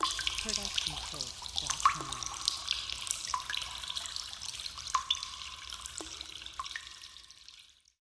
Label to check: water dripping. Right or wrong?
Right